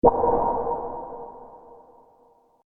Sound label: Space Style Ambient Noise, Eerie; Sci-Fi; Stuart Duffield